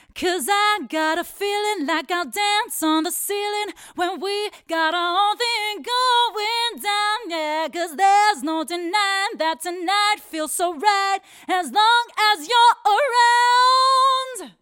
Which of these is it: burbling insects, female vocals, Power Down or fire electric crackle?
female vocals